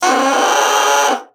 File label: BASSOON; Xsample VOL-6